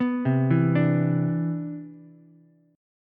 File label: C Major 9th, Ukulele, C6; arpeggiated